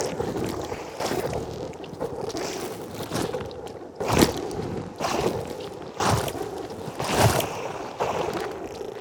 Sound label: idle loop; Swarmfeeder; SWARM ENEMY